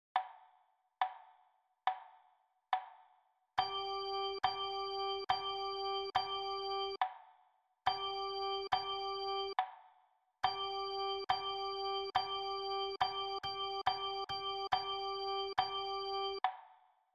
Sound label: Rhythm Practice G note